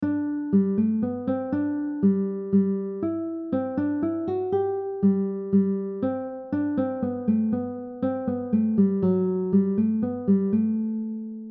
melody, guitar; Minuet in G; guitar backing tracks

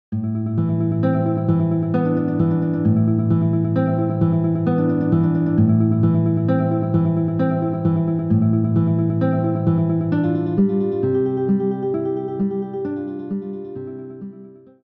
Recuerdos de la Alhambra guitar; Tarrega

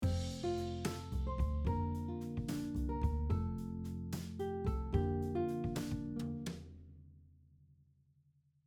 outlining chords example, F, Bb, F, Bb, guitar; The following example outlines this simple chord progression: F, Bb, F, Bb

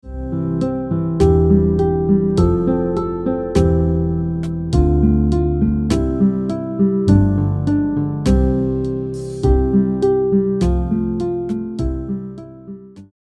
Twinkle Twinkle Little Star guitar

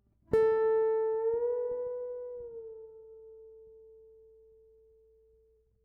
bend and release, guitar; guitar string bending

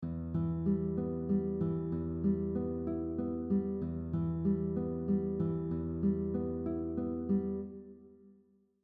Fingerpicking exercise 1 arpeggio, guitar; strings 4 to 2 and then do the same pattern with strings 3 to 1

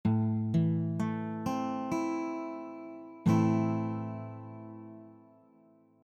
A minor, guitar chord; A minor chord basic; strum this chord from the 5th string down

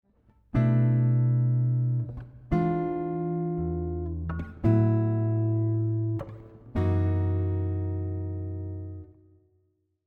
Extended chords progression, guitar

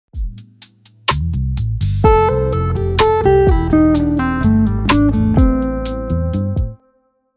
Hammer Ons and Pull Offs, guitar

